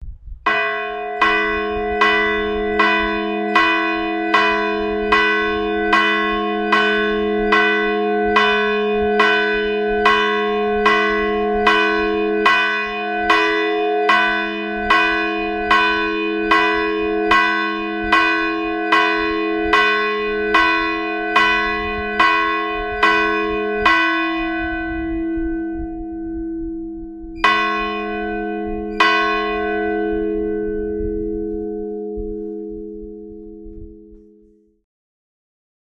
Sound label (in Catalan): avis rosari, toc, campana gran, Seqüència: 30 tocs i per finalitzar 2 tocs secs; campanar; Josepa – Francesca